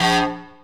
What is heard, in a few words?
stab; Dirt-Samples